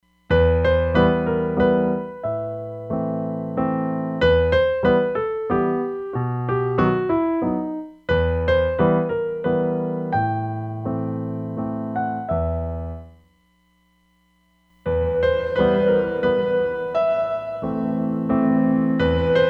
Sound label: Concert Hall, Roland DEP-5; Factory Patches